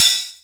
Jomox Hat

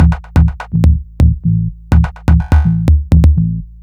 125BPM Bass Kick, techno; drum fx; Beats

